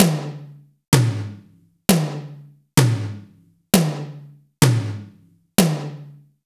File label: two drums